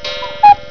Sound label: cuckoo; wxWidgets